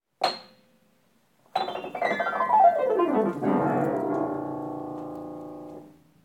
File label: High to Low